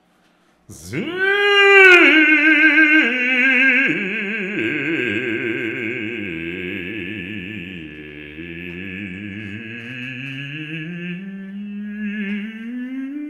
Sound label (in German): Vokal i